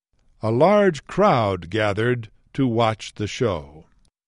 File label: CROWD